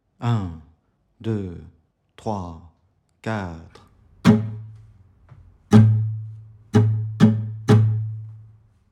exercice 060bpm 1 mesure

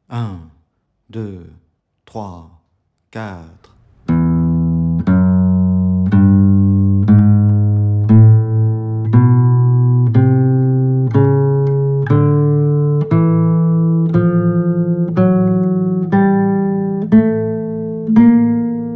exercice 060bpm

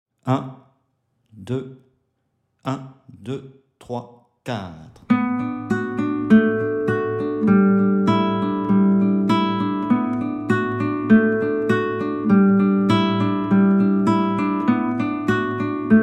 exercice 100bpm

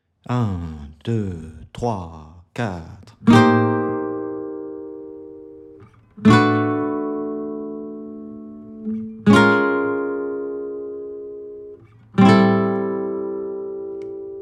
exercice demi barre sur 4 cordes exercice d 080bpm

